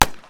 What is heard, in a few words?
kalash fire